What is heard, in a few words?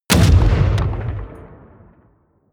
artillery light close